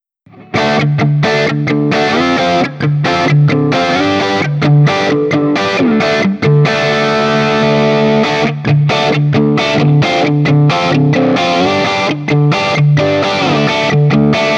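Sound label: Guild Burnside Crossbow BN JCM800 D, guitar; Burnside (by Guild) Crossbow; D-Shape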